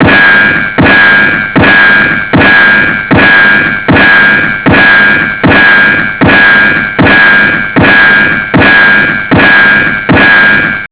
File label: Fill